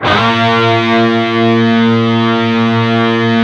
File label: PRS, Guitars, PWR SLID